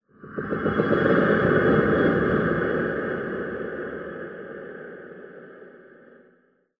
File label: amb prepper rhythmic, ambient